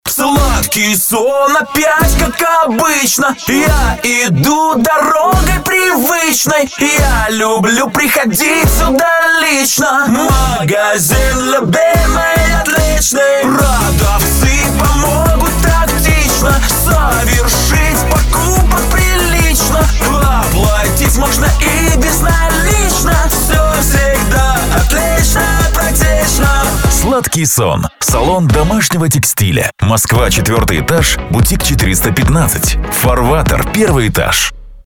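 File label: vocal; Sladkii son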